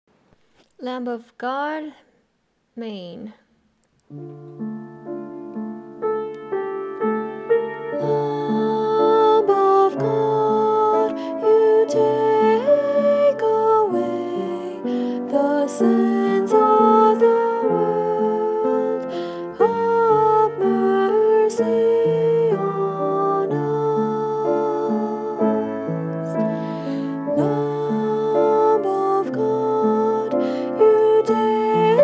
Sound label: Sop Tenor